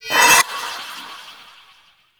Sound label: Heavy Scythe Blade Accent; Warframe; Duviri Heavy Scythe